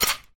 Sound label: Stop Turning